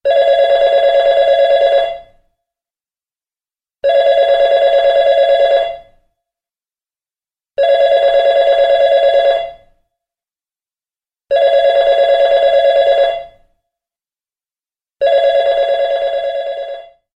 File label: Office Phone